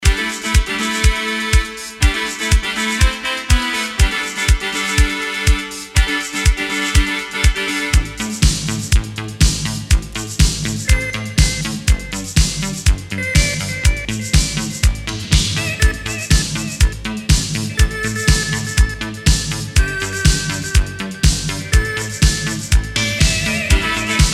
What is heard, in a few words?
Instrumental